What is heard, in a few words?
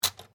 ADDING MACHINE SIEMANS ELECTRIC 1950S SINGLE KEY, Electric adding machine; Typing Sound Effects; Typing (Sound Effects:Offices)